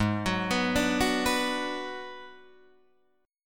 G sharp Minor 7th Flat 5th G sharp down Guitar Drop D